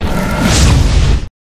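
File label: Dragon Skeleton attack up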